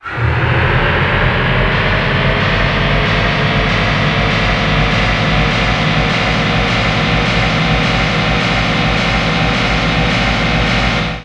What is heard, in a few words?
NOIS; XXL 800 Production Effects